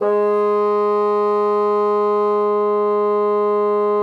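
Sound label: bassoon mezzo G#3; Xsample VOL-6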